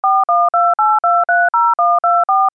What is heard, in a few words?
home Phone